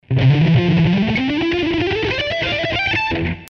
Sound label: scale patterns, guitar